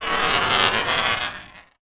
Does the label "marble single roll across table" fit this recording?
Yes